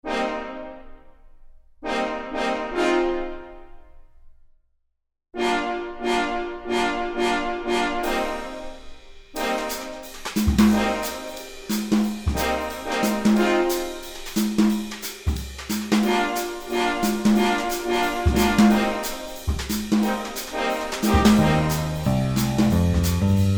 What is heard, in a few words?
A Bit Jazzy